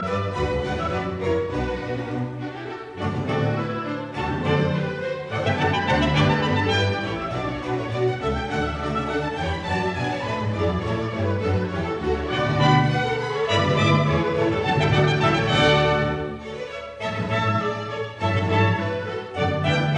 Overture Suite in D major Bourree; Bach; Otto Klemperer

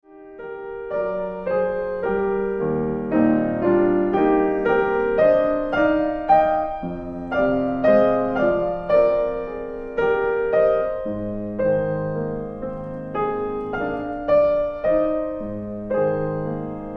Preludes for piano Andantino

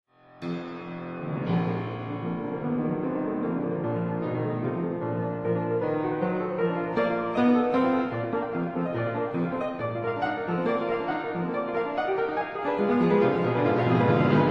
Piano Sonata in F sharp minor Allegro

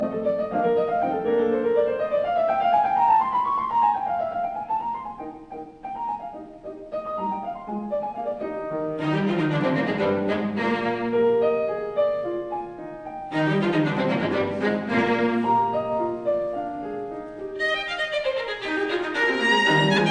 Piano Quartet in E flat major Allegretto; K 493; Budapest Quartet

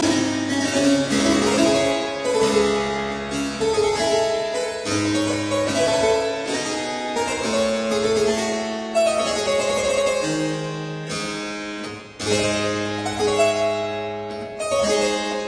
Suite in D minor Saraband; Croft; Arthur Haas

